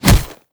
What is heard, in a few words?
shoulder pound, zombie impacts; creatures